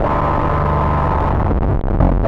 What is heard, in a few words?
Bass, Distorted; Bass X